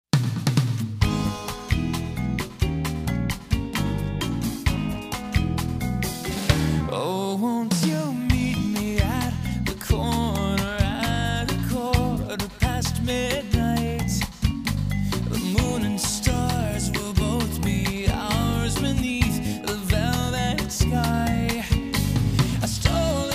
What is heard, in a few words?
Rock Track